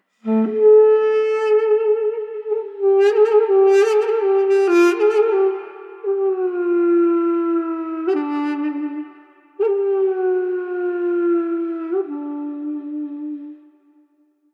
Duduk; raji an ancient epic; Vishnu Temple

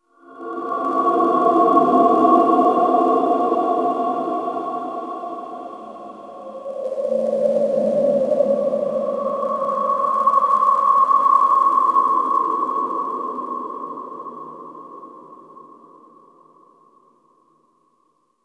Drone

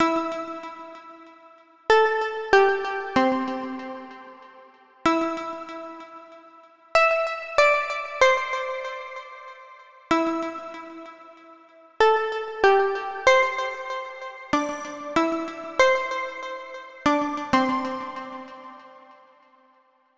Harp Melody

